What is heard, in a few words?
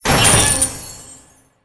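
big treasure box death, monster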